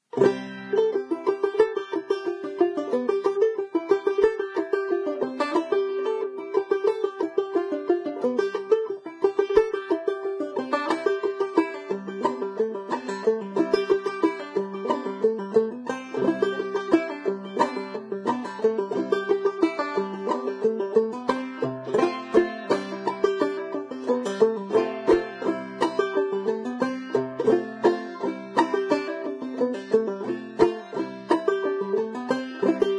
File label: Banjo; Zomboid; Lifestyle